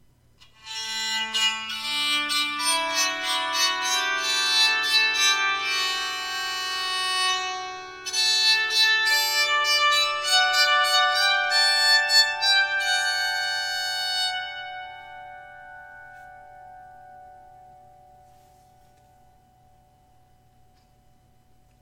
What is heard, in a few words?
song, bowed psaltery